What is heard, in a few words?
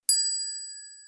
ding; alexa